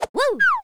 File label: symbol Drop